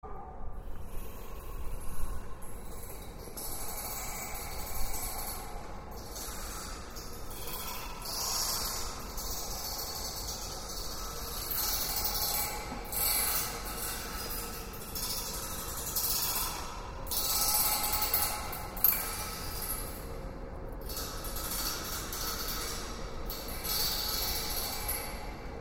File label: keys, fences, arches, birds; SummerWorks Listening Choir #1 - Part 4